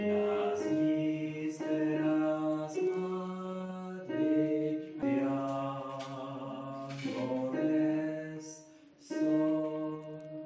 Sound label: alto